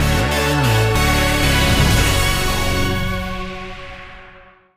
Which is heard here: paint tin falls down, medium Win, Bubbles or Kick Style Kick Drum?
medium Win